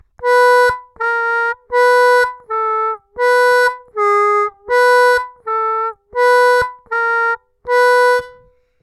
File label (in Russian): отрывисто, губной гармошки; 3 -3’-3 -3’' -3 -3’’' -3 -3’' -3 -3’-3